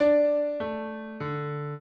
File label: piano; Mozart